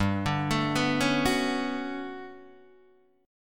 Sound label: G Major Sharp 11th G down Guitar DADGAD